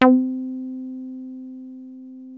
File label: 303 C4, TB 303; Hollywood Edge; Giorgio Moroder Rare Synthesizer Collection